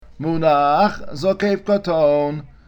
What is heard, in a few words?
munach zokaf koton, cantilation